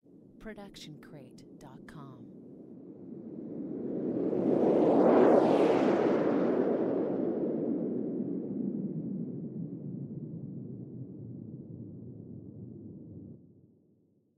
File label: plane fly by; soundscrate